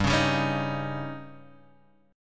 Db Suspended 2nd Flat 5th

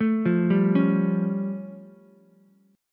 A Augmented 7th A, Ukulele; arpeggiated; 2,5,3,4; JGuitar

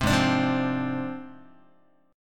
Ab 6th Flat 5th Ab, Guitar; Ab6b5 strummed; JGuitar

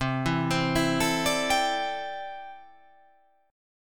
C 6th Add 9th C down

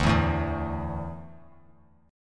D Suspended 2nd D down; strummed

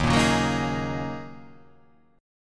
D sharp Minor 11th D sharp, chord

